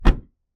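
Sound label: close Kei car door, 車のドア内側から閉; 軽自動車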